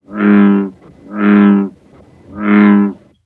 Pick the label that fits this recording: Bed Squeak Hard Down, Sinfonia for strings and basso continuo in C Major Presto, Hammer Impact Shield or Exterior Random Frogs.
Exterior Random Frogs